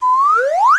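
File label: slide whistle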